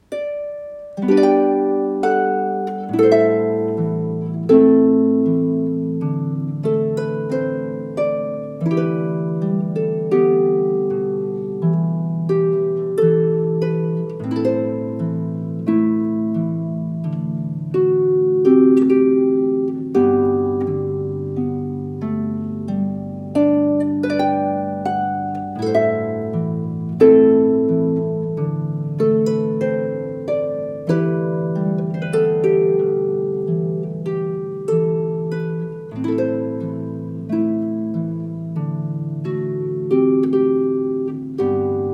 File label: harp